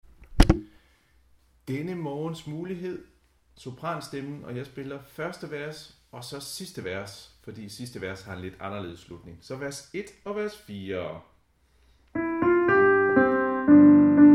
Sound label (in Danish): sopran; harlev koret